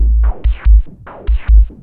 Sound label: PULPITATING, OLD SYNTHS; Rubber Chicken Software Sample Bank